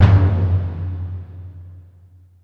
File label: conga